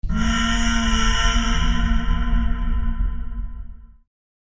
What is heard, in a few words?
distant grunt i think; random and out of place